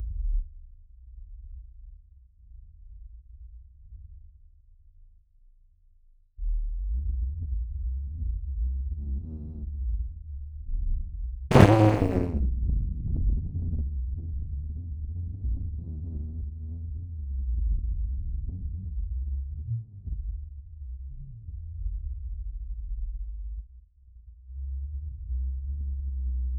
pedo grave y ruidoso; constant

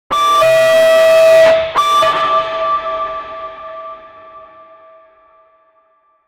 alarma de emergencia, descending tone

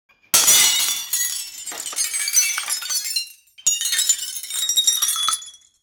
espejo quebrandose abrupt

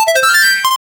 sonido huesos videojuego; bones; sound effect

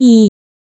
sonido de puntos sumando, tally

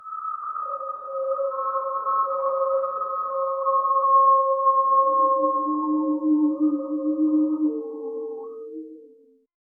una mariposa de seritu co, butterfly

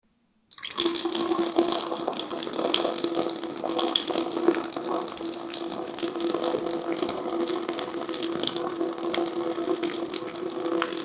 orina cayendo al agua del; bathroom

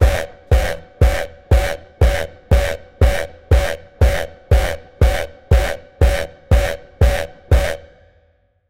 ritmo de diablada de orur, folk music

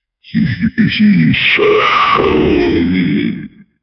satanas, evil

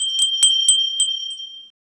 una campanita sonado un; bell

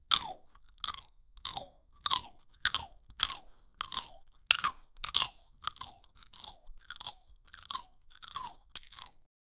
masticando, eating

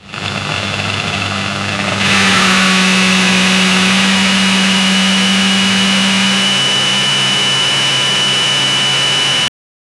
sonido un quad ltz 400, atv

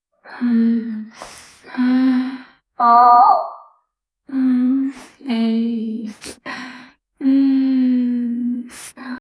mujeres placer sensual

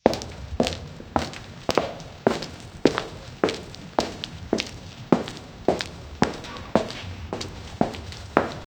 fuertes pasos sobre un, concrete

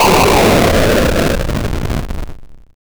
explosion

